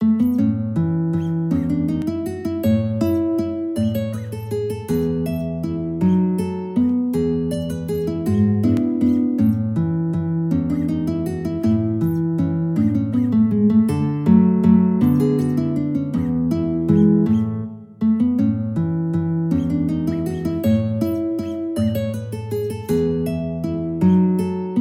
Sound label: Waltz, guitar; Strauss; Costa